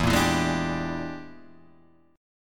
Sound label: E 7th Sharp 9th Flat 5th Guitar Half Step Down, chord